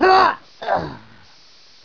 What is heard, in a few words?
death; sorlag